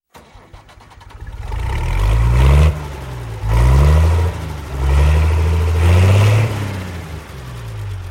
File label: Tractor